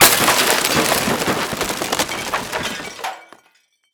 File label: Crash Window Shatter; MinorHallutinationsSounds; SFX Producer